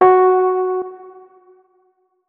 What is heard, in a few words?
notes, keyboard, electric piano